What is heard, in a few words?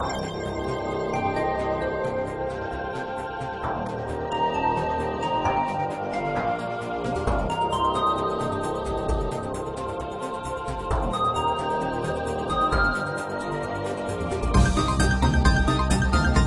music speed